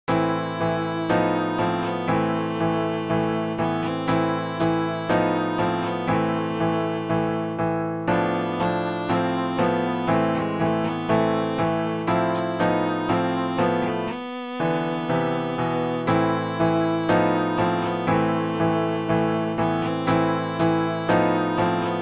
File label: march slav violin